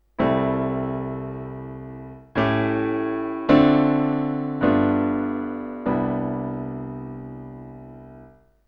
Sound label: 3 step cadence